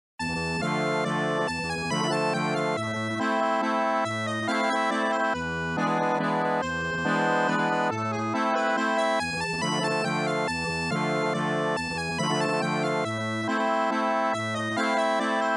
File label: Accordeon